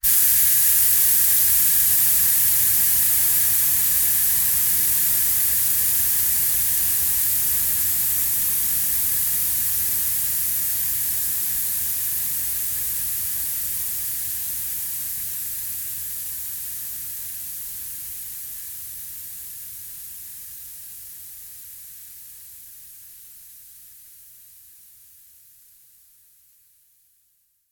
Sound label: transport car tyre puncture fast; zapsplat